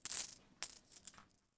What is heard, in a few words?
coins, SHOP; AMBIENT; Pirates Of The Caribbean